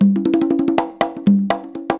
120 CONGAS, Percussion Loops

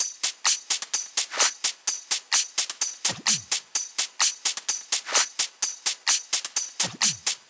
Loops 128BPM, Drumloops

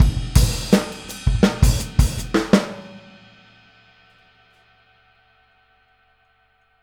Boogaloo - Outro - Full Mix, Drums, 82 BPM

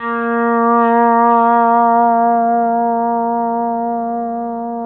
PEDSTL SWELL A#3

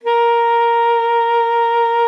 A#4, saxophone; Best Service ProSamples vol.42 - Session Instruments

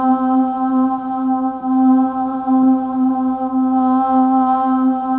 SOFT VOX, AMBIENT LOOPS; Zero-G Ambient 1

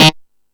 Killer Synth, E-MU Pro-Cussion

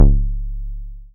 Bass SH-101

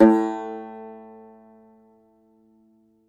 cumbus saz A1, plucked strings; E-MU Producer Series Vol. 7 – Old World Instruments

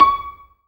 ZIMBAL C#5

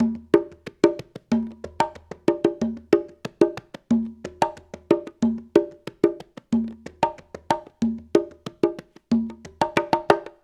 World Congas, 93

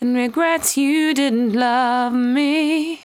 74-5bpm B, Velvet Vocal; While U Could; Zero-G

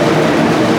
A#4 BIG DR; Northstar; The Wizard VOL-1; DRUM INERTIA

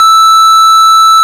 ORG Farfisa; Roland LCDP09 Keys of the 60s and 70s 1; Farfisa Clar

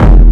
Dup, Kik, One Shots; Sonic Foundry (Sony Creative Software) - Future Retro Dance Excursions

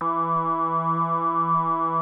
JUNO 60 E4; Hollywood Edge - Giorgio Moroder Rare Synthesizer Collection

